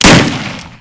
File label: m1885 shoot, weapons, ww2; sven; ww2projekt